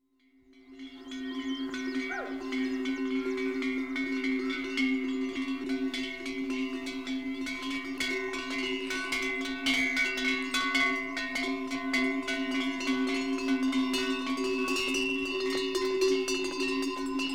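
Mule Train Passes Left To Right, India Pakistan Nepal Countryside; Sound Effects Library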